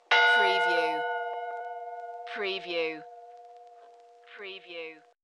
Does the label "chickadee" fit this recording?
No